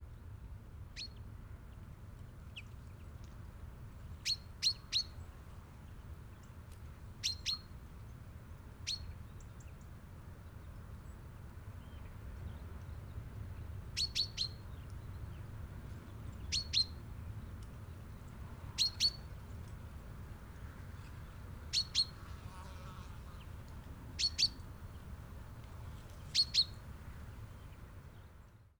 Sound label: Common Chaffinch Fink Calls Of Female